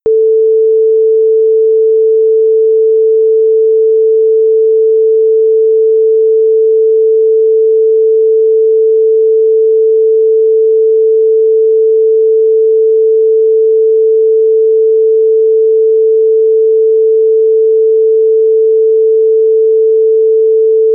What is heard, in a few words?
442hz s wave